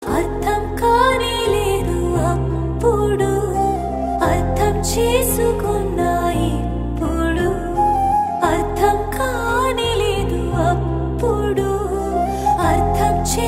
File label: Telugu Song